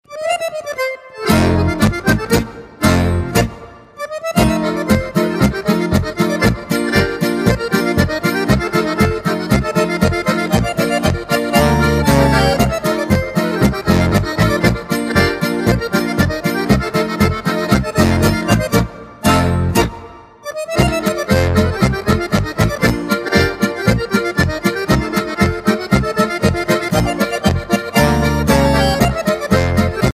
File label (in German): Akkordeon